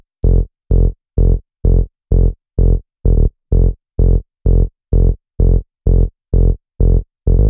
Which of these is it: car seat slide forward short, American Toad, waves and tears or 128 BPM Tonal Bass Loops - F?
128 BPM Tonal Bass Loops - F